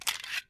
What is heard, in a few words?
mag Small Remove, weapon reload